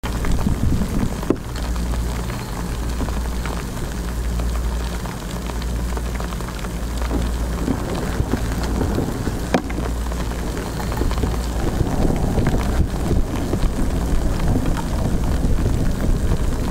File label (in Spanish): ASMR Sonidos de lluvia; tono de lluvia para celular